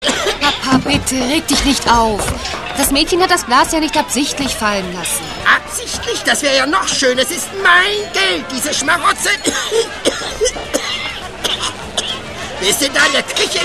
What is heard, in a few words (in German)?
partylaune